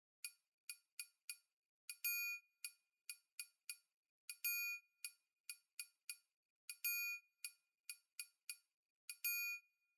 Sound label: Triangle; Stylus